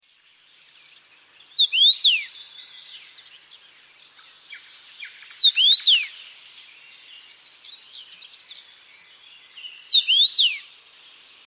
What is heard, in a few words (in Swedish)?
Rosenfink; natur